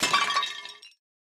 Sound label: Break Vase; Boomerang Fu